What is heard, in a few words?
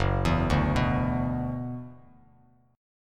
F sharp 7th Suspended 4th Sharp 5th, Bass Standard; 2,7,0,4; arpeggiated